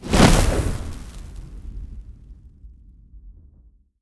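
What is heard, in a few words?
magic destruction cloak shock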